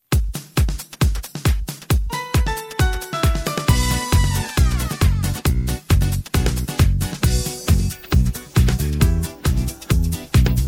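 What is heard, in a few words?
backing track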